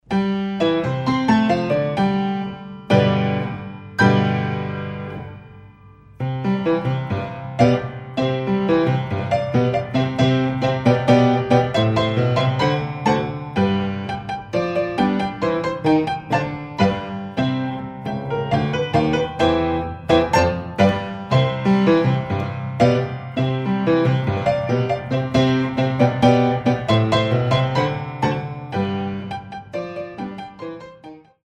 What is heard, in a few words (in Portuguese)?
fortepiano